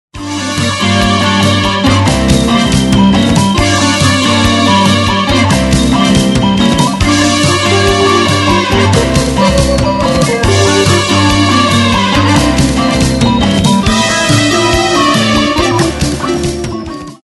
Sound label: dog lost jazzy